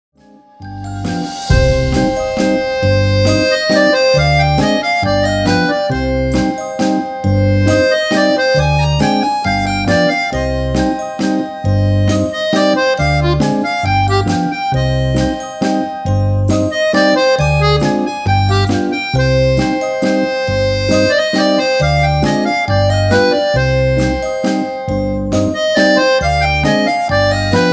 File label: Childrens Lullaby